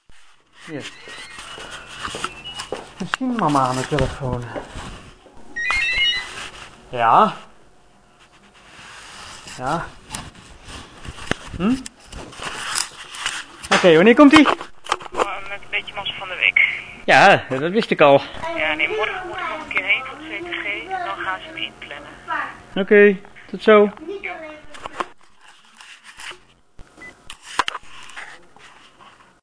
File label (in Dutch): telefoon; AMC